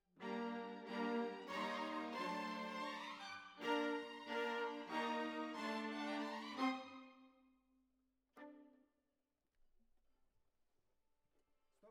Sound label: Violas Over